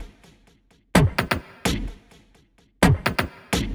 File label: Minimal Loops